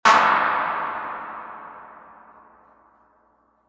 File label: mod reverb tank 3-spring long drum machine clap